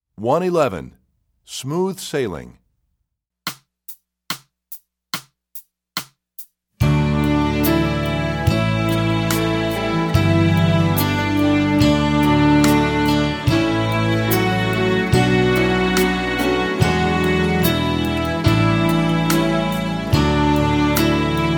Smooth Sailing, strings; EE2000 Book1

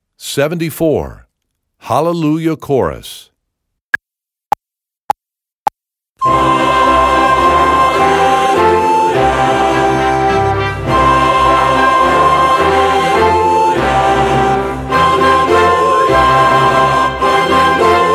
Hallelujah Chorus, Recorder

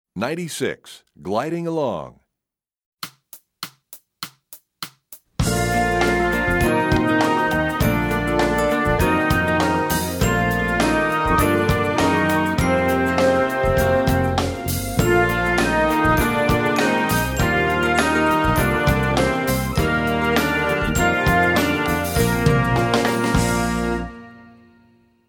Gliding Along, Brass WW; EE2000 Book1

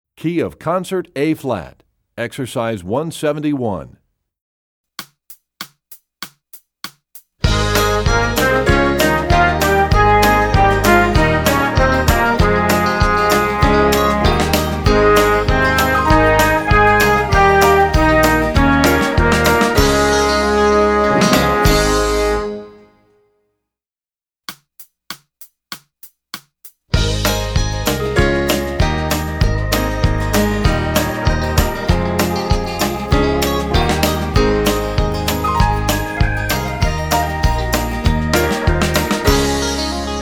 Studies Concert Ab; EE2000 Book2; Rubank

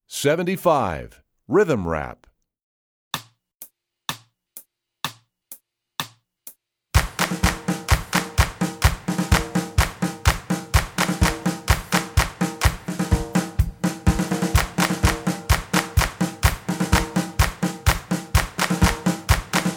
Rhythm Rap, Strings; EE2000 Book2

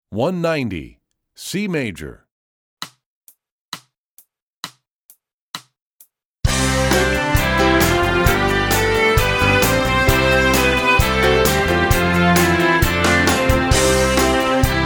C Major, Strings; EE2000 Book2

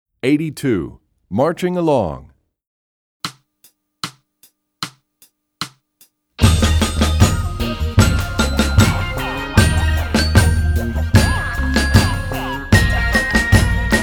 Marching Along, Percussion; EE2000 Book2

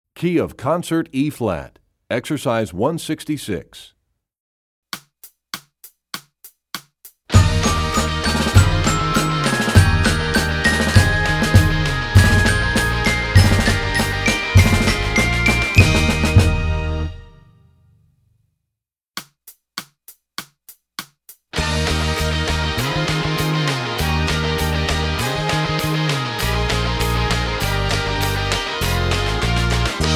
Studies Concert Eb, Percussion; Rubank; EE2000 Book2

